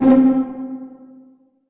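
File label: string pizzicato C3 mezzo forte right; Sound On Sound - Peter Siedleczek's Orchestra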